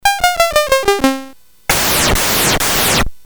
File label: sid 8580; Bowling BASIC